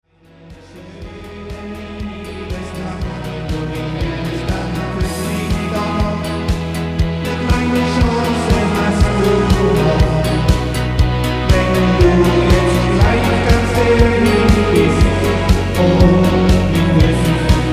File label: medley